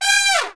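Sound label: brass, Tpts FX Falls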